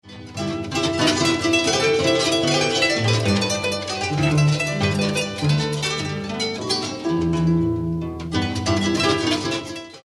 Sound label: strings